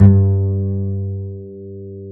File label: DBASS G; Univers Sons; Jazzistic